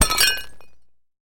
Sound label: Glass Breaks Light; windows & mirrors